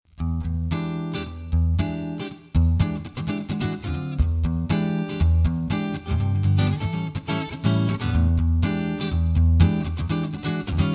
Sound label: split funk